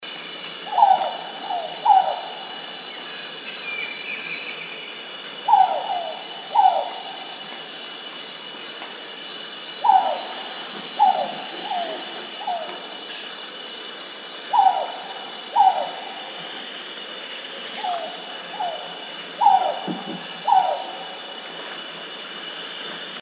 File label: jungle hawk owl